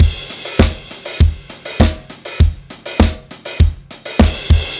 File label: Charisma Drums